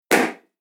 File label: Bang Sound; Bang Sound With Delay; Bang Sound Effects; Impact / Explosion Sound Effect